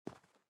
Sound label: SFX step rock